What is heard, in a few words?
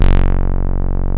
MC 202, SEQ-FX; Masterbits; Vintage Voltage